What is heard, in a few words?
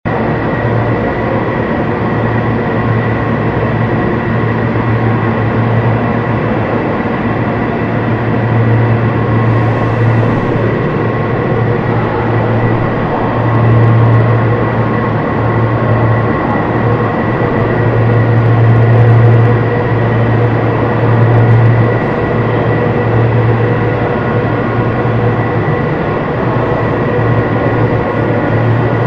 Cable Car Museum low roar